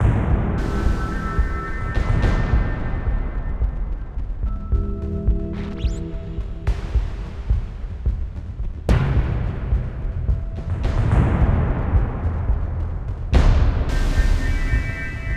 Music Template - Tension; Mass Effect 2

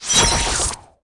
Storm Rage; Inotia 3; Babarian

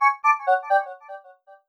Jingle Hidden Item Found

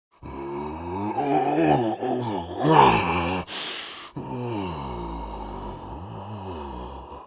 walk; guns n zombies 2014 pc; Golovan

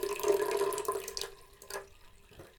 Bath Emptying; The 39 Steps

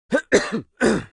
cough; battleblock theater; narrator voices; puppetshow BOSS

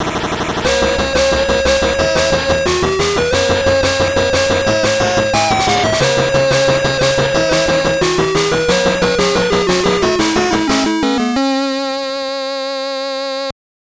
Jingle, gb; Jeremy McGrath Supercross 2000; gbc

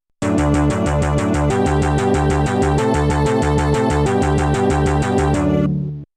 Knockdown; human killing machine amiga

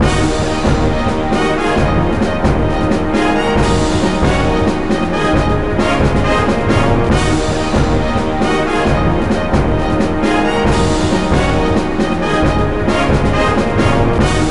Combat Industrial; Sid Meier's Civilization Revolution; nds

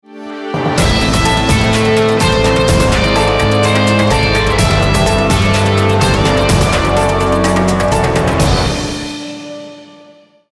Bad jingle; CitiesXL; Windows

